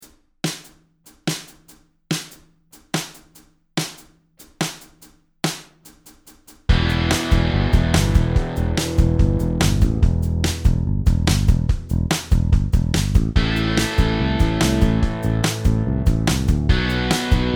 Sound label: backing track no click